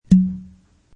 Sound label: Cork pulled from bottle; Bars; Corks